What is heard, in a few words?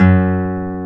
Classical Guitar, Nylon String; Roland LCDP02 Guitar and Bass